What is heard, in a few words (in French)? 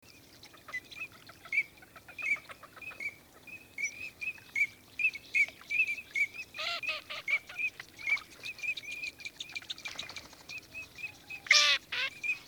sarcelle d hiver